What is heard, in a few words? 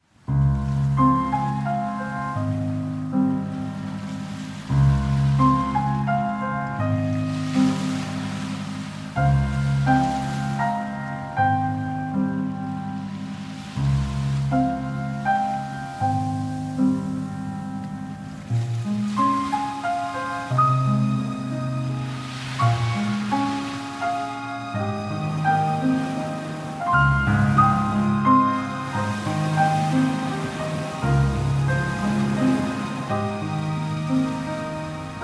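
Nature, ringtones; Samsung GT-C3060R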